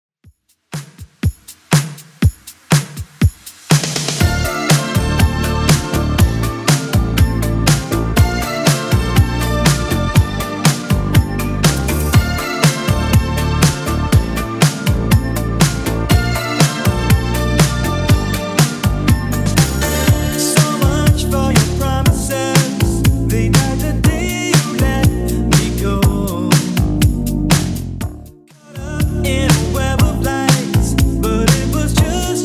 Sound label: 80s Redrum 121bpm